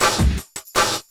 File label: LOOP SD, Drum & Bass, 160 BPM; Ueberschall; Drum & Bass Resonance